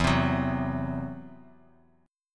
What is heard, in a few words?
E Suspended 2nd Sharp 5th, Guitar, Open D; 2,3,4,x,x,x